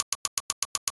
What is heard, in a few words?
Spike Timer; Playable Tutorial Level